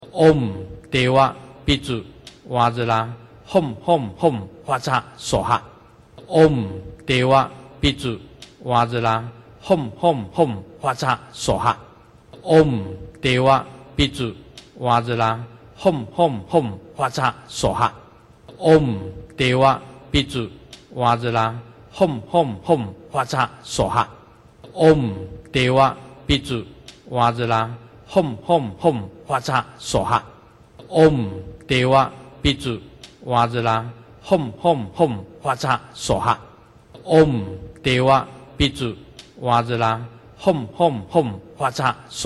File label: Hevajra mantra